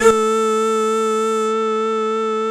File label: VOXY A2; Best Service Alpha Dance vol.2